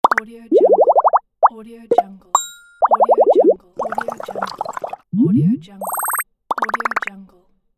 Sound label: bubbles sound effects pack